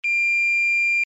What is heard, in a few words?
alarm tone, sounder alarm tone; sounder beacon